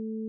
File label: error alert